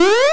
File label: Jump; Berry-Dash